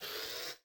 inserter basic; factorio; Factorio 0.14.21

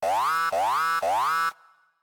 alert damage; factorio; Factorio 0.14.19

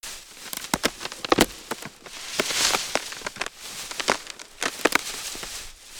car tree slow impact; factorio; Factorio 0.14.21

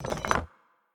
deconstruct large; factorio; Factorio 0.14.18

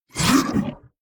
worm roar; factorio; Factorio 0.14.21; creatures

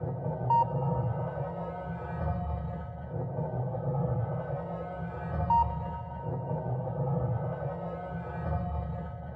radar; factorio; Factorio 0.14.21

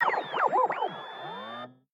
flying robot; factorio; Factorio 0.14.21

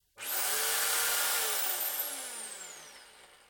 manual repair advanced; factorio; Factorio 0.14.18

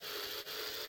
inserter long handed; factorio; Factorio 0.14.21

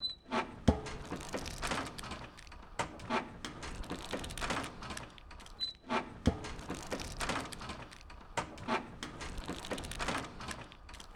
pumpjack; factorio; Factorio 0.14.21

